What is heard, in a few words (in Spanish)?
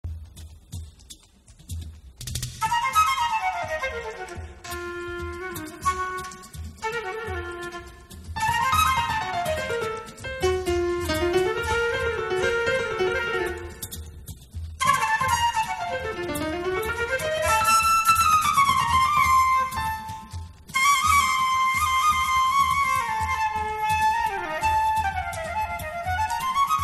Buleria solo primero dos, flamenco flute